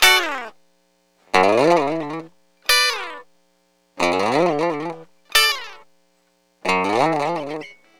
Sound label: Lounge Gtr D Dry; Zero-G Kocktail Kollection; Construction Kitz (Lounge); Welk Lounge 090